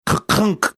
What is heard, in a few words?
mimicking mechanical sound cassette players